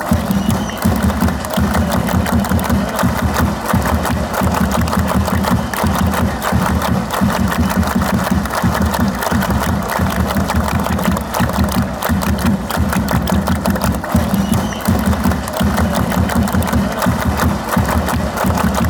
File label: claps, chants